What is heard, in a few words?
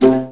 VA PIZZ C2, Orchestra; Best Service; Peter Siedlaczek's Advanced Orchestra